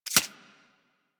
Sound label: Arachnoid Micro Step Snow; Warframe; Corpus